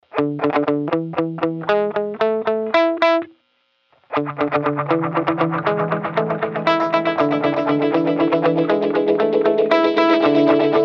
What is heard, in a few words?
Multiply with Sync Phase